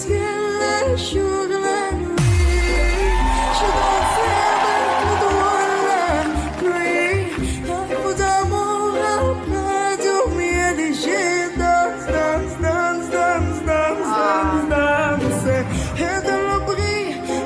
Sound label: new english arabic ringtone